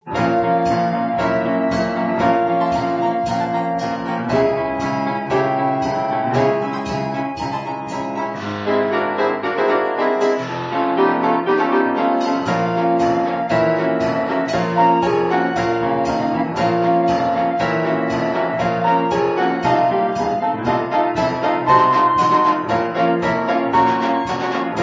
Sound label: hupfeld model, band organ; HUPFELD MODEL 19 SINFONIE JAZZ